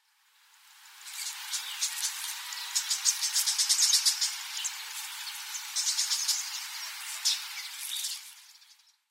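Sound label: Brown Shrike; yonaguni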